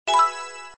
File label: button Sound; jumping rope